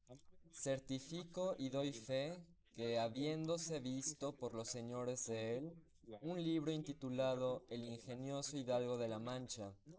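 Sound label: male, instantaneous mixture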